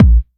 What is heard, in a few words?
TR909 kick, Roland SH-32; Drumset 03